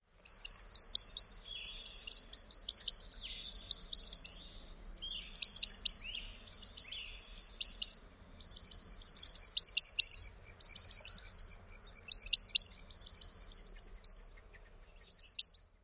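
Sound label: solitary sandpiper calls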